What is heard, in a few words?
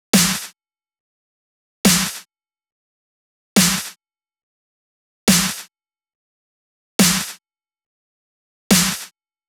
140 BPM Break SN Dry; Essential Clubsounds Vol.5; Catch a Plane Kit; Root A#min; Drums & Perc